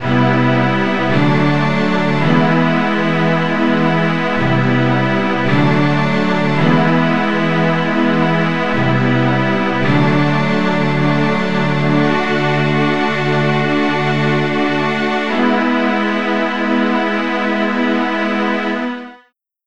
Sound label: strings